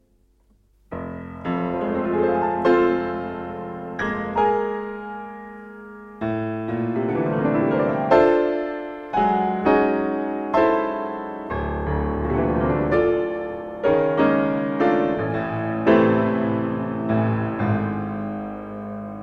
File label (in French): Concerto pour piano et orchestre Andante